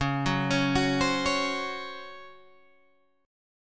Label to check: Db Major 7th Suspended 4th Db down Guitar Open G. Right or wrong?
Right